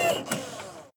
winch Small Motor Stop; Kerbal Space Program; KAS

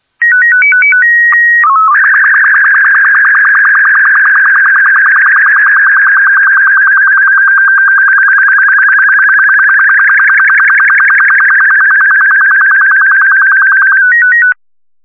sstv; Kerbal Space Program; Chatterer

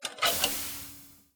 unplug docked; Kerbal Space Program; KAS

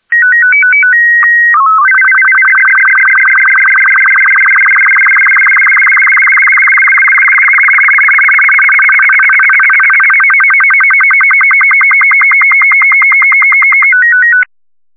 sstv; Kerbal Space Program; Chatterer

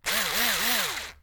detach Screwdriver; Kerbal Space Program; KIS

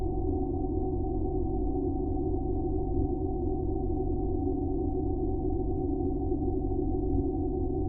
alien ship idle; background; Kerbal Space Program; Chatterer; AAE

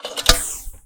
plug docked; Kerbal Space Program; KAS

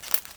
inventory Close; Kerbal Space Program; KIS

